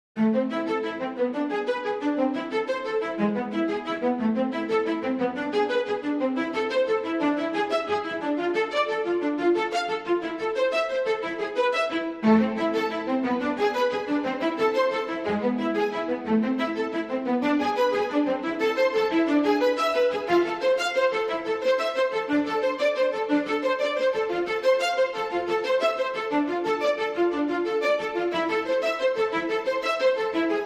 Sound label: Dramatic strings; Bootflare